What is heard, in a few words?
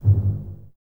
BSS G 1 Pizz, String Ensembles Basses; Miroslav Vitous